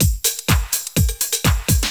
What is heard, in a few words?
DRUMLOOP HOUSE 125; eJay